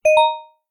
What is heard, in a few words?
notification; samsung Aleart